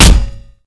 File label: hit impact, manhack; hl2